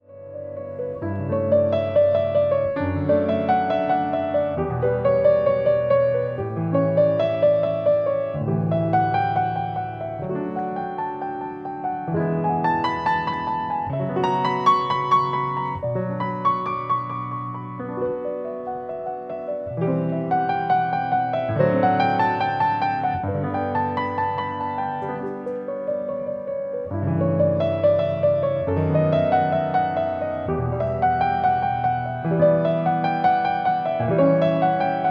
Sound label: Prelude B minor